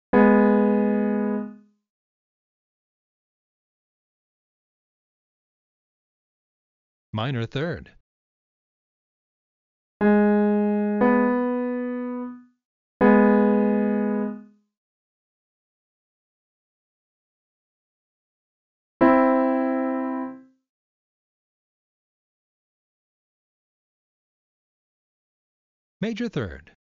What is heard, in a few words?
m3, M3 (harmonic); intervals